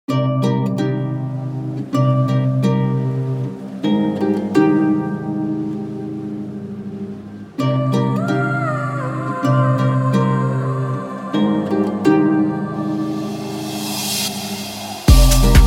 荒野ステージBGM【Wind】 Loop; Apploval

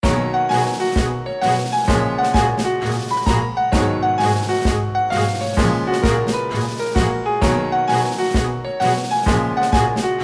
ワールドマップ loop; Apploval

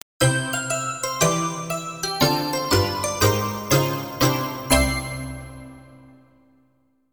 ジングル; another one final edition

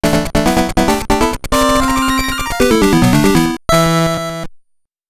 ゲームオーバBGM; Motocross Maniacs

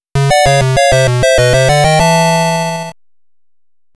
ゲームオーバー!; ge cen love plus pengo original soundtrack 2014

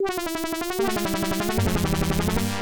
ゲームオーバー; SNK 40th Anniversary Collection; NIS America; PS4

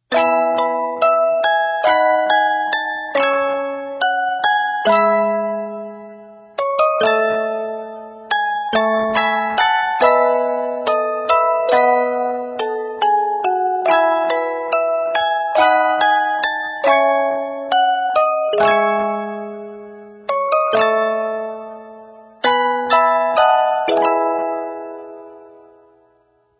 オルゴール

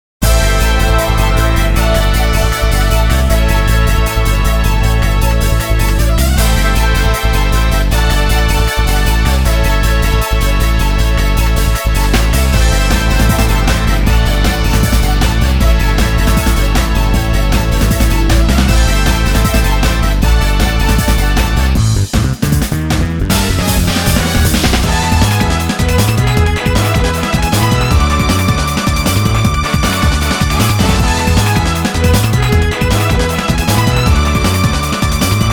砲撃戦 バトル; Kuutei Senki Tasogare ni Shizumu Kusabi; 疾風の空賊王女編

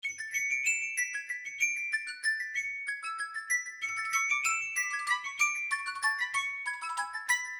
電話 携帯電話 着信音; The House in Fata Morgana Dreams of the Revenants Edition

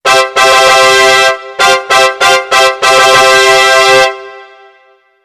ファンファーレ

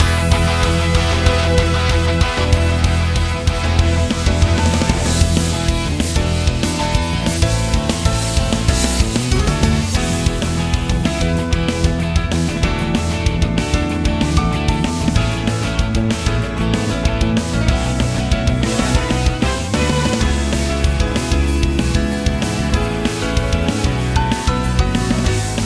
テーマ曲 インスト loop; Apploval

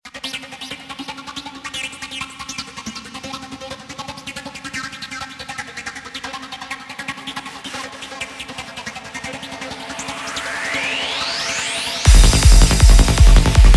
ボス BGM, Loop; Apploval

